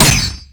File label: katana sword